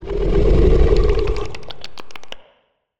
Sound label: Alien Passying by, Monster Sounds; TheDeclineOfWarriors; Monster Sounds Volume II